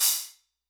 White Chiff, Hats; Airwindows

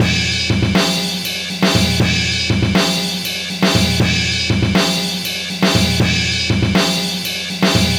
120 live reggae drums

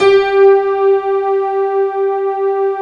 piano pad G4

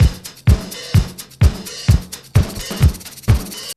BEAT, Roots Disco; USB Soundscan vol.32; AKAI

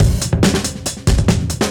Beat Style, 140bpm, breaks

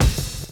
DRUM CHOP; AMG Gota Yashiki Groove Activator

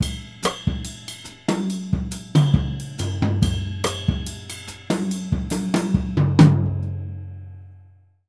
Jazzistic; Univers Sons

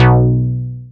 Synths BASS

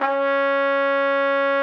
TROM C#4, TRUMPET; Session Instruments; Best Service ProSamples vol.42

